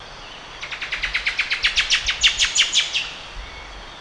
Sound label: sumatrana, Presbytis sumatrana